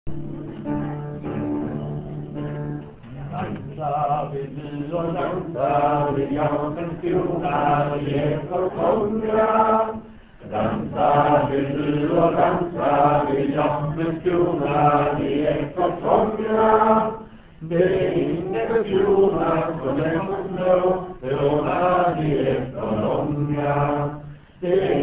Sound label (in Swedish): melodi